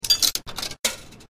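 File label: vending machine coin drop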